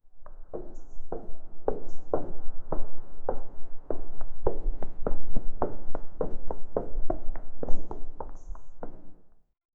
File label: occasional distant corridor